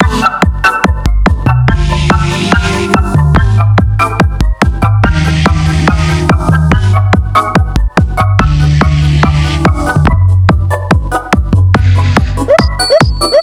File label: Melded, Drums Beats